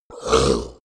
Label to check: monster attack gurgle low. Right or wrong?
Right